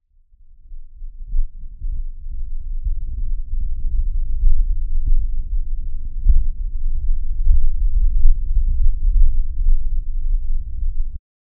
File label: low freq thunder